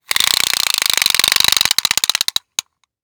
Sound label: Industry Tape Measure Unwind Click